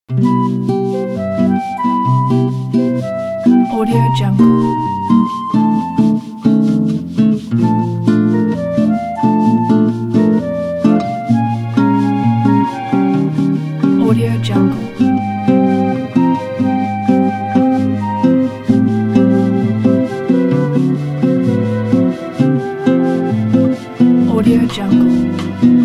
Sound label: love bossa